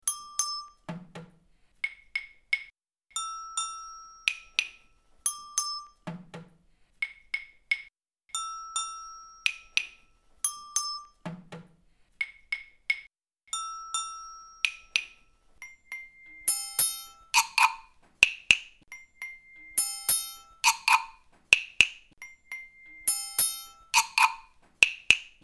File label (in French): Boucles rythmiques; PROJET ÉDUCATION MUSICALE EN MATERNELLE ET ÉLÉMENTAIRE